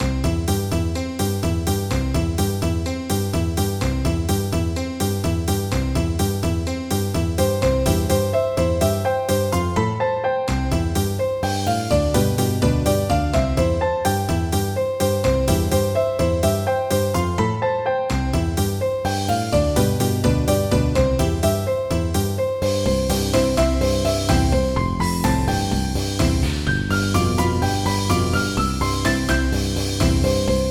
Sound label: music box